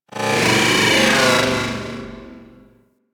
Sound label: Corpus Ship Robotic Random Calls; Warframe; Corpus Ship Remaster